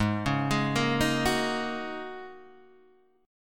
G sharp 7th Suspended 2nd Suspended 4th G sharp Guitar Open D